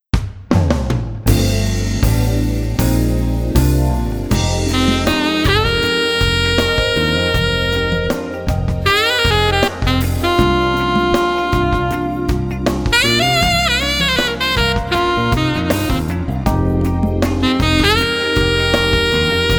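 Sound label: Custom Alto, sax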